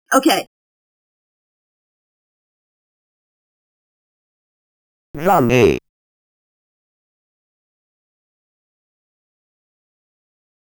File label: vocal bits